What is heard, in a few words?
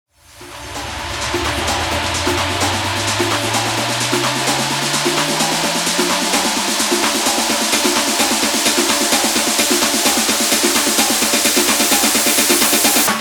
majestade real tech remix 129 bpm